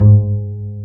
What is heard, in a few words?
STR Cb Pizzicato; Roland L-CD702